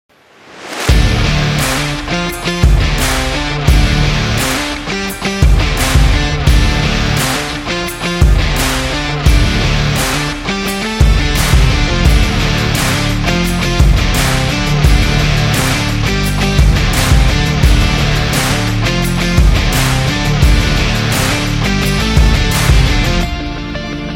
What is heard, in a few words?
Rock Energy